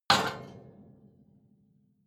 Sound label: metal impact iron ring rattle